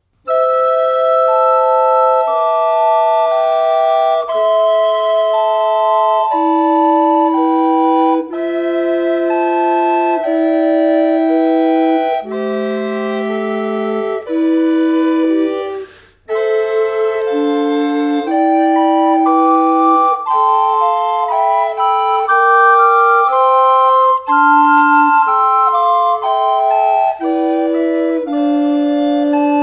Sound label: SATB, recorder; The Plaint